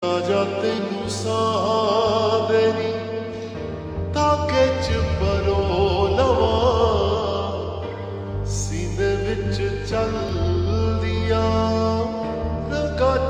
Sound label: Ringtone Slowed Reverb